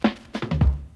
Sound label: CLASSIC FILL, DRUM FILL; Crypton - DrumBase5000